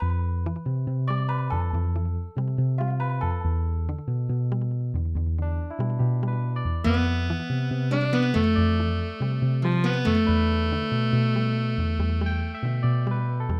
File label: Bossa Nova; Legend of Zelda, The : Majora's Mask